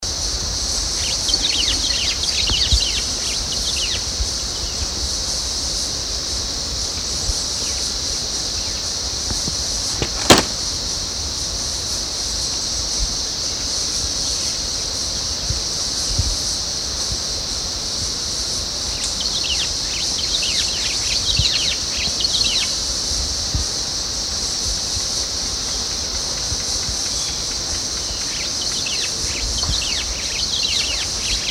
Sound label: white bellied cuckooshrike